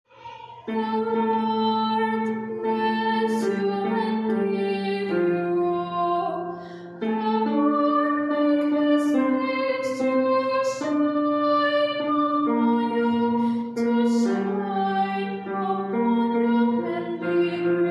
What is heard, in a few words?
tenor